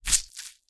Gore - Crunchy Flesh Impact And Blood Squirt - Hard